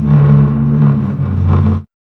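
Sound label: LOWSYN, low synth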